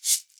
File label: shek, WORLD PERCUSSION; Best Service ProSamples vol.52 - World Instruments 2; MIXED PERCUSSION